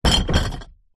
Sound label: household recycle bin plastic bottles card knock bash